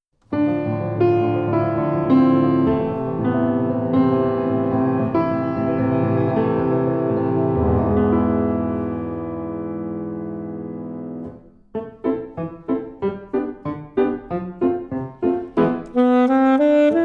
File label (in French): Sax, Poupees russes, saxophone; Escapade musicale saxophone